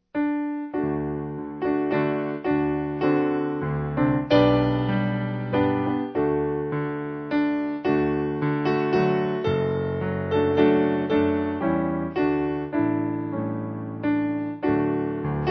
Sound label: Piano